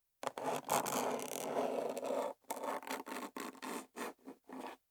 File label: wood; 木材を爪でひっかく音